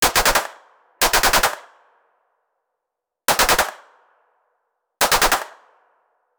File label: sequence of, pistol